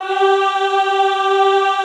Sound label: EHH G3, Classical Choir; Best Service